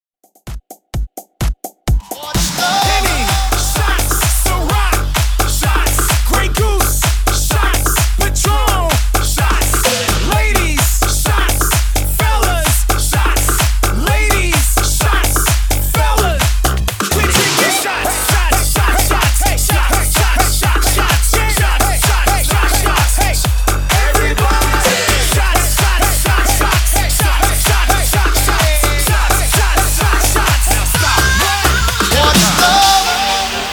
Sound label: 90S DANCEHALL HYPE 128 BPM